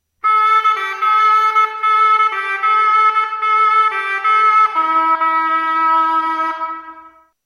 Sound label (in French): chalemie; instruments